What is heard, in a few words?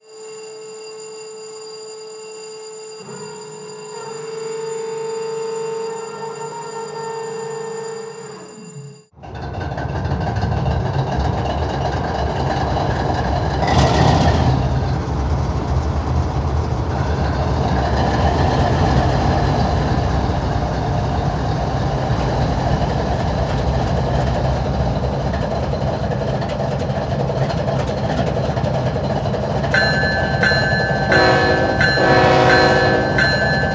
GE Gevo-12; GE Gevo-12 ULT